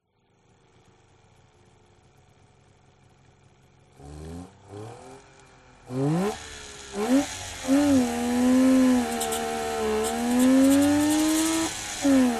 TRANSPORTATION CAR Saab 92 Drive Engine

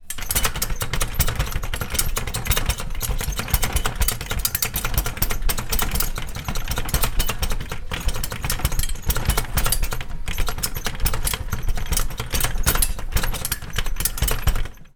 trailer bumpy movements shaking; transport

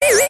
robot servo motor mechanical whirr fast movement; science fiction

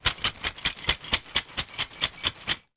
foley broken dish pieces in bag shake; zapsplat

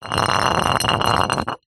household jar glass thick empty roll concrete

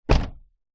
vehicles truck door close

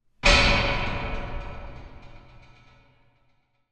sound design big horror hit metallic cinematic

